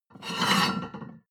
plate on plate take slide hard

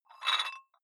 dumbbell take off rattling weight, fitnessstudio

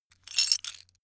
metal pieces clatter short

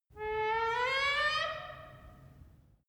wood door old creak high soft

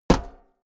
step walk metal hollow; schritte

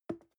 plastic can small fall bounce soft; objekte; dose

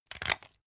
pepper mill scrunch; soundpool

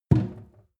sink pull plug no water; industrie-wirtschaft; gastronomie

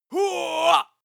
male attack shout long; schreie; menschen

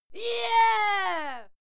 female cheer yeah throaty; schreie; menschen

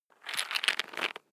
nut handling; haus garten; kueche

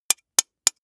soda can tap fingernail, restaurant cafe

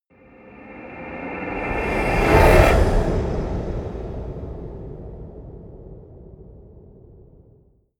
haunted shout, risers; whooshes rises

